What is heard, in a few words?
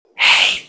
say, notify; NovaRealm